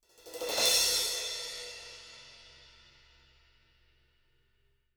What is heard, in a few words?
CYMBALS; Sampleheads; Peter Erskine Living Drums; SD CYMBALS